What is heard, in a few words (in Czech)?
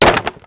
zidle; Mafie 1